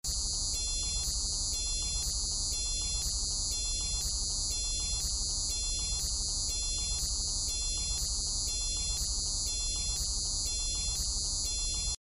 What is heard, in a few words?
timeout warning beeps